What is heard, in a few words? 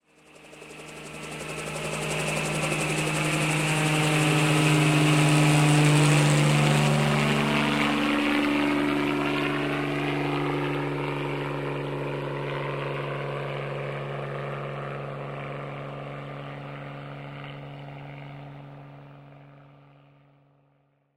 chopper pass by, doppler